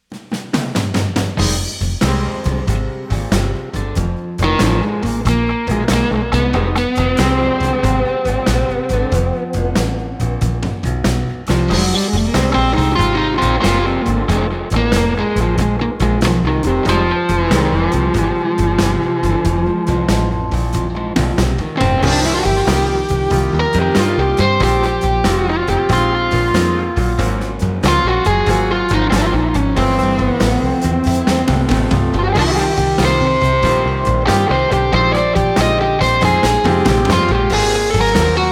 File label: guitar; Piet De Ridder; pianoteq8